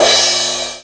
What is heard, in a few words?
Crash Cymbal Low; GMPercussion; 3DO; Toolkit 1.5; GMPercussion22k